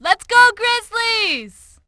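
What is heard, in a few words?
"Grizzlies", fan; NBA Inside Drive 2004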